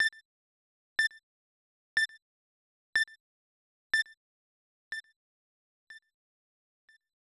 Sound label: Countdown for Blast; Cyber Knight; SNES